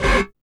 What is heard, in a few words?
dance chord